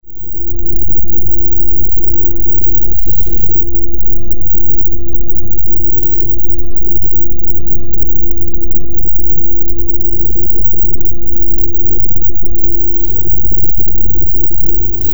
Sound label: Dying Breath, gourd